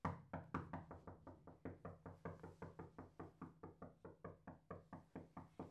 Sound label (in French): frapper la porte; Les ecoles